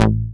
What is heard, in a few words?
Bass A, Offbeat Bass; VEC1; Club